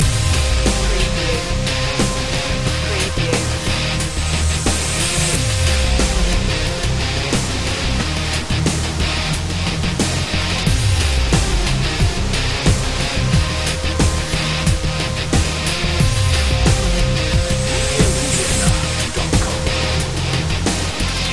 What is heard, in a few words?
slow jam rock loop